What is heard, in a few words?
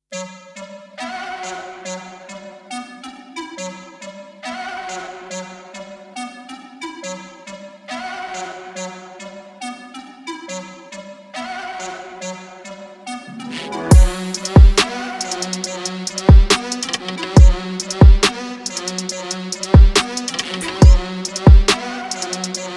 Instrumental